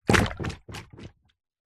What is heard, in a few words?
foley balloon filled water small drop carpet bounce; zapsplat